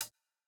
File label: Jrock hihat